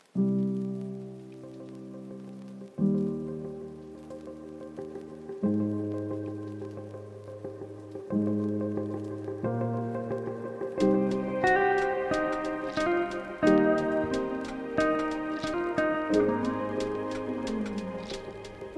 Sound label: Instrumental Lo-Fi